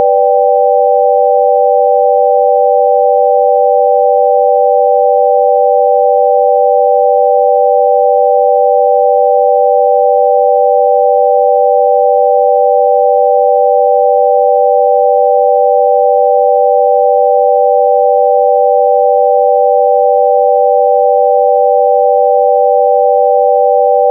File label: chord